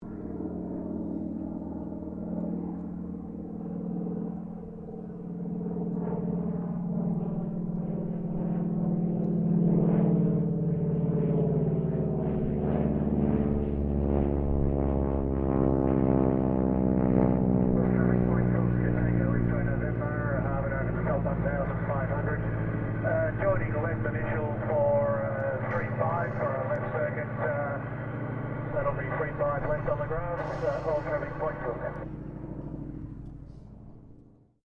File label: Aircraft AT-6D Harvard Mk IIB slow pass radio chatter, Harvard MkII training aircraft; 1942; Airplanes